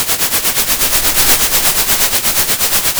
pop, Sound of a train

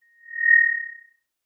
Sound of an owl

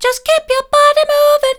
just keep your body moving mid, vocal

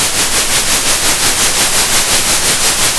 center, Sound of a train

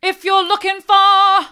hard, vocal

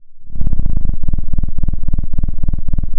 pop, Sound of a cat purring

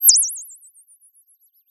Sound of a bird tweeting nicely

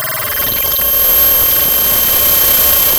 pop, Sound of a cat meowing

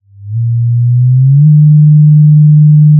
pop, Sound of a cruise ship horn

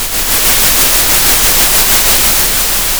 pop, Sound of a thunderstorm

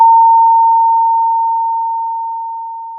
pop, Sound of a school bell

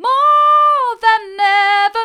more than ever hard, vocal

